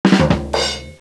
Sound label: punchline